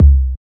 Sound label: Kicks